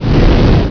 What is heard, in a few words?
Dragon Fire; Playable Tutorial Level; TR2